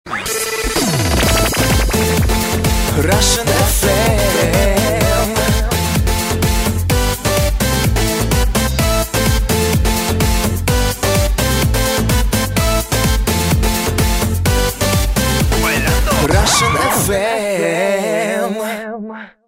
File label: russian fm